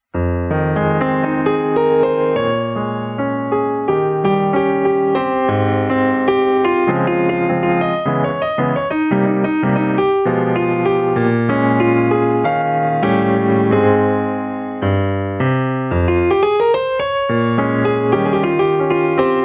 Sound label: piano